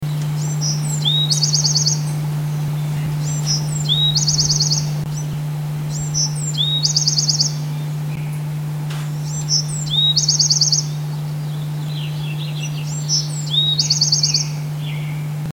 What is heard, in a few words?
Bewicks Wren